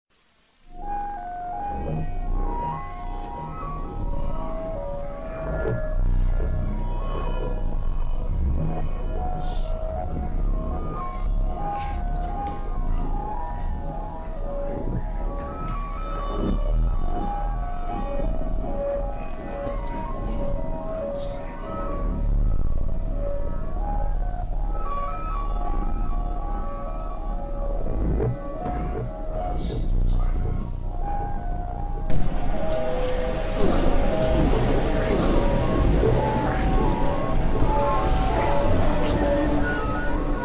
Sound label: audio creepy